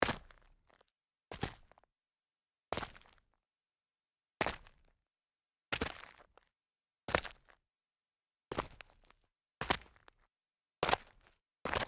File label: sneaker small jump rough grit, foley